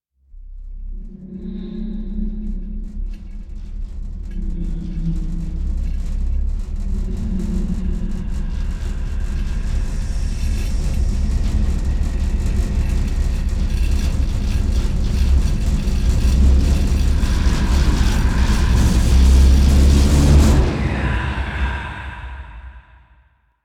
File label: Seance Two Rumble Build; Warframe; Harrow